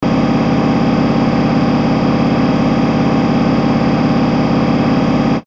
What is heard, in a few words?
train engine